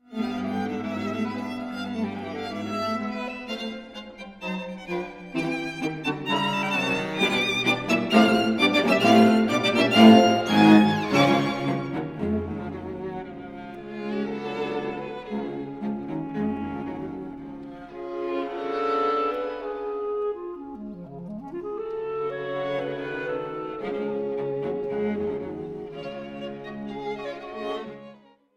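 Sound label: quintet for clarinet and strings in f sharp minor; samuel coleridge taylor; 4th mvt finale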